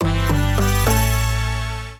item get

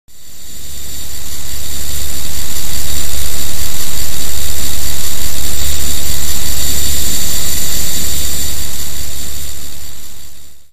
power plant

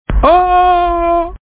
ohhhhhhhh

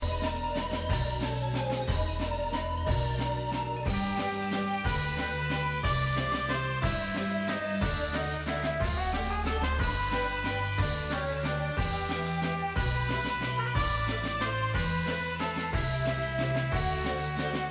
scary carnival

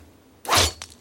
sword swing notification sound

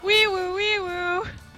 weeoo

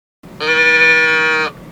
moo

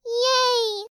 cute yay

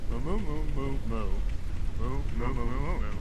moo cow; diablo2

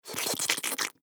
slurp fast male

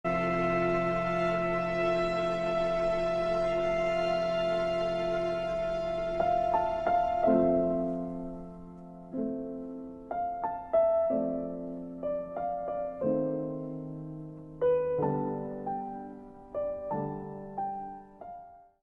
up sad soundtrack

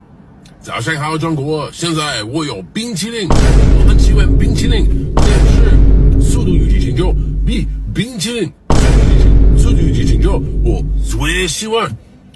bing

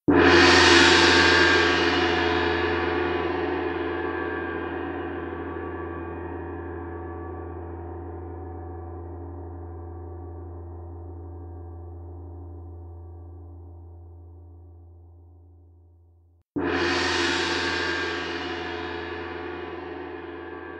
Gong Sound Effect

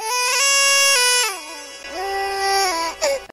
baby crying in autotune

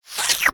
cartoon lick slurp; zapsplat

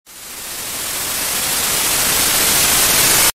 Static

